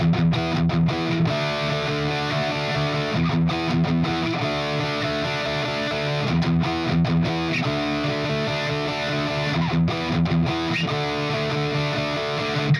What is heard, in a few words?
EMG89, guitar